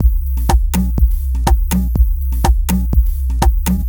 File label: F# 123bpm, House Drumloops; Zero-G House Fabrik Drumloops